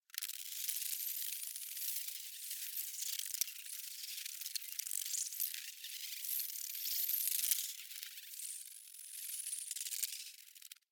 Insect Ambience - Movement Buzzing, Clicking, Twittering; Hollywood Edge; sound designer's toolkit v2